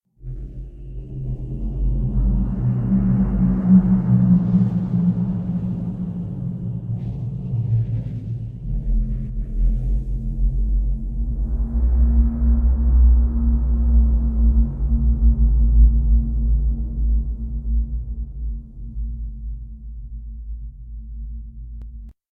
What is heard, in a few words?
Ringing in the ears; SyrinScape; Missing Magistrate